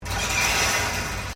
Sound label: Wire Fence Impact; Material components to create more complex sounds; Hits; Objects